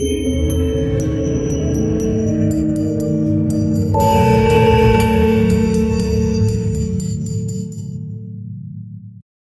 slow, tolling

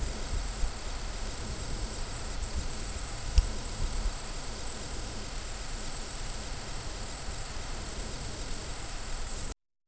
croaks bugs buzzing dense, swamp

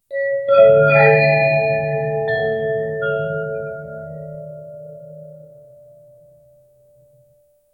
dark gothic bell chime wi

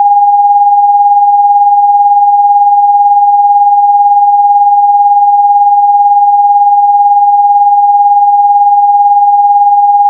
a high frequency sound at, electronic

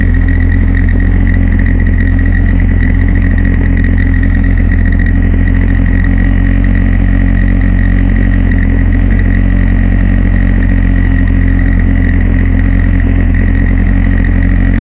v12 engine 700hp; 24liter

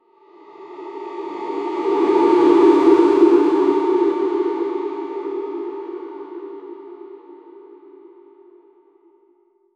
reverb, cavern

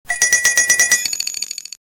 gaming clipping sound

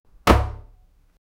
in the table open the, box opening; SFX Engine

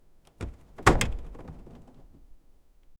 open the door slowly, ambience

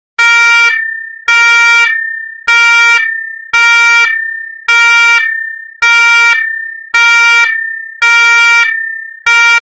the continuous blaring of a ships emergency alarm

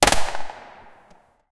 30 mm cannon with reverb

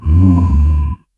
low rasping undead growl

